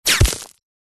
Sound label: firearm bullet impact; mace